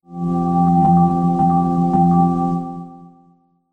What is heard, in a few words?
windows 3 51